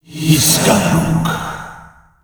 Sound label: Alt Fire Whispers; Warframe; Tenno; Grimoire